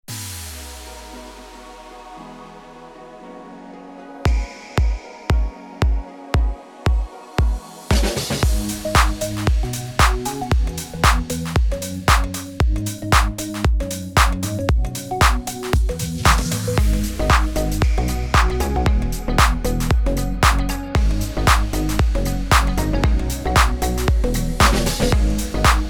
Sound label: DeepHouse Beat